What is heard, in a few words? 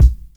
clean kick single hit a sharp key, Royality free kickdrum sample tuned to the A# note.; kick drum samples